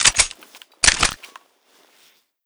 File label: mp5 clip out; mp5navy; weapons